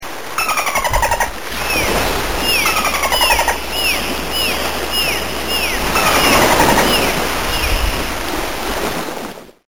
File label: dolphin